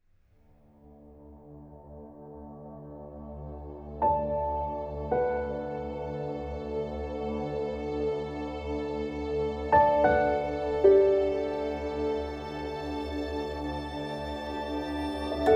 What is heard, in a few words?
uplifting span